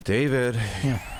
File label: sigh